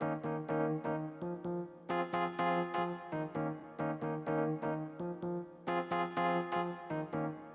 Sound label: lead